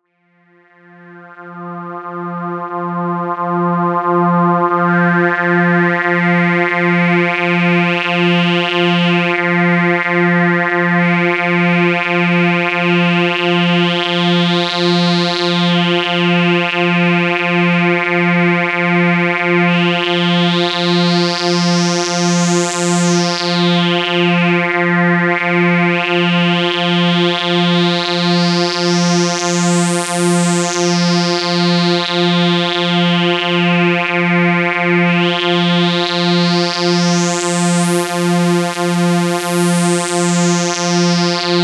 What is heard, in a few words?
Bed Synth Wah Filter; Blastwave